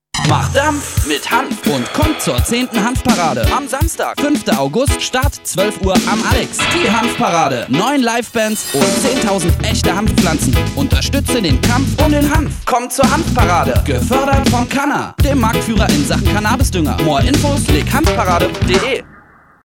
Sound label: jingle; hanfparade 2006